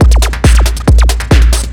drm 138, Techno, drum-loops

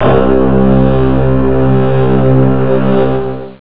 violin string; ST-XX Amiga Soundtracker Sample Packs

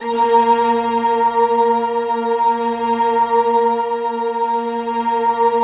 sky choir, Random Sky; Optical Media International; Sonic Images Library

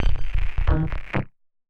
Casio, Glitched, malfunctioning synth

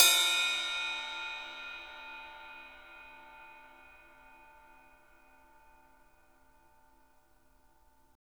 RIDE B M R; Roland MV8800; RIDE CRASH

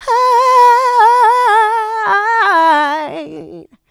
Vocals, Adlibs; Houseworx

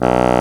Orchestral Winds Bassoon; Roland LCDP04; WND Bassoons

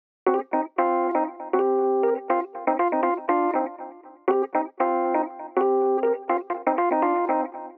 El Piano 120bpm Cm, Nu Disco; Zero-G; Construction Kits; Legacy

